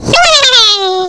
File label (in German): Ballon Freude; Jump Zampoli